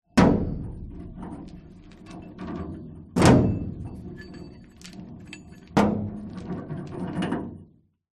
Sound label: industrial chain movement against large hollow metal clunk rattle